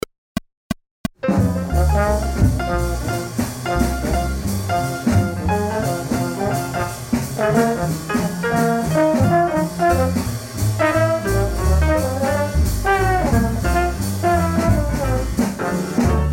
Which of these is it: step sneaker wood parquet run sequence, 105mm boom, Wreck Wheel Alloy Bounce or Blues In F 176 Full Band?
Blues In F 176 Full Band